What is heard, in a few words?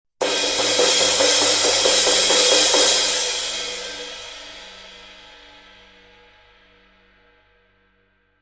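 ride, Z402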